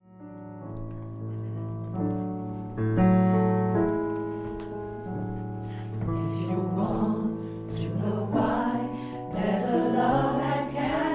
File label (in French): couplet unisson; couplet 2; gospel